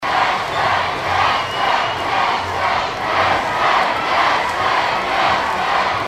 skandirujut

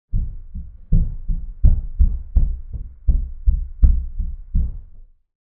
begotnja sosedej sverhu

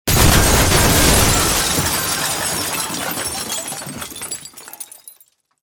odin moshhnyj razrushitelnyj udar po stene doma so steklami; Звуки строительных работ; снос зданий; Звуки строительных работ обычно связаны с разрушениями, такими как снос зданий

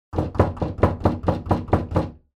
rashljabannaja doshhataja

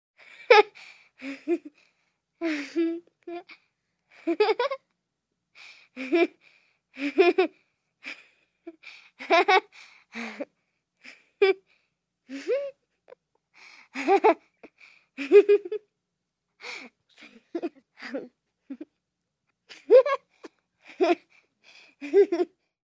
korotkie smeshki

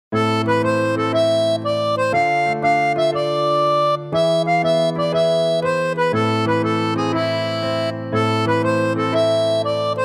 garmoshka

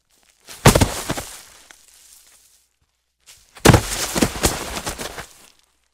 dva padenija na travu; Звуки падения человека

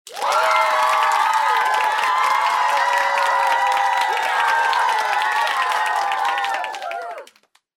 radostnyj vozglas i apl

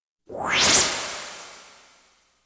Stremitelny povorot s ehom

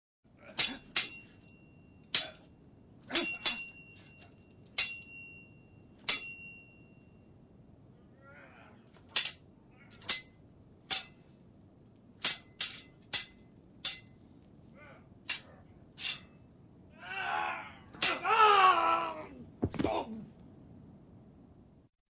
postanovka dvoe fehtujut i krik v konce

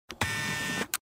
zvuki kamery

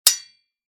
udar; Звук мечей; Звуки сражений на мечах, звук ударов меча

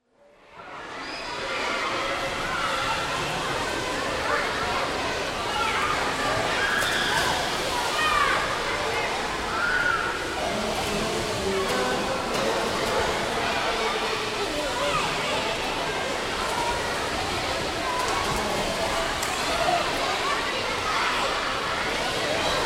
atmosfera shumnogo basseyna s detmi

